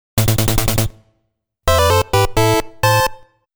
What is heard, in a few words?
Miss, Game Over